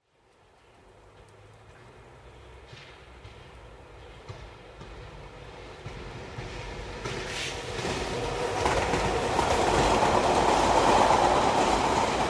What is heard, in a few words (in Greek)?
Mesa sygoinwnias Treno